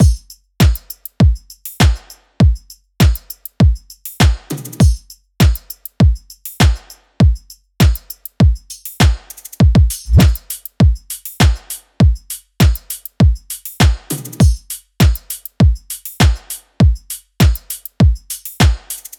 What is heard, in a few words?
loop full 100; Seismic Loops